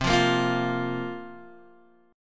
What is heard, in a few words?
C 7th Suspended 4th, Guitar, Open G